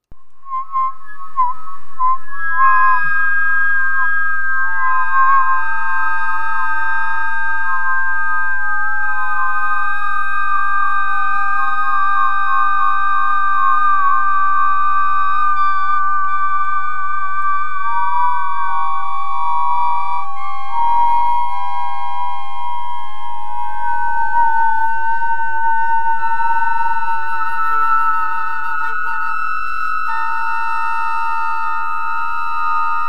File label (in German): pfeif drauf long